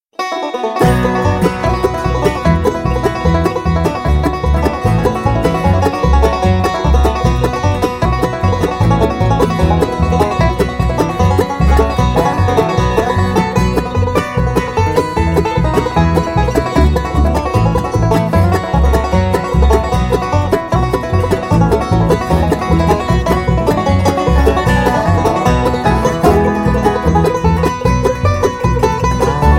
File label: banjo